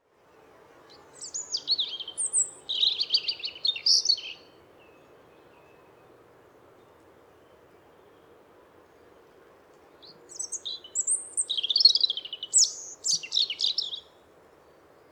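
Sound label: European robin voice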